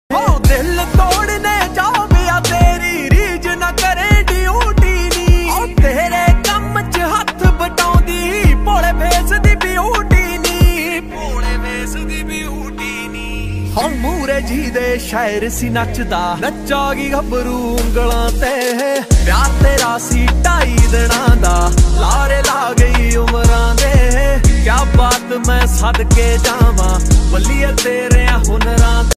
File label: Dance Punjabi Ringtone